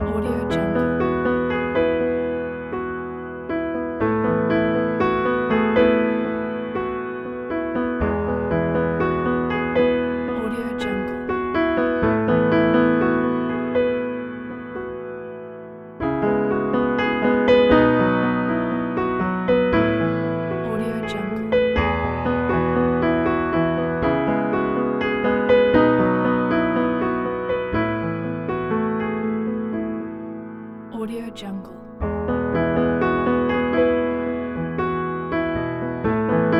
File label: positive flowing piano; jkmusic